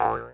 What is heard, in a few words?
spirit death; FlyForInsanity